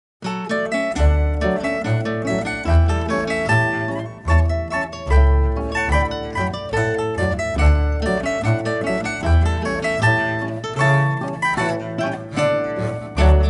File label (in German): Volksmusik